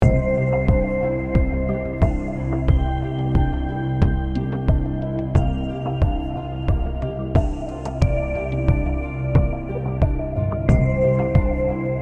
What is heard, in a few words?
BGM Friendly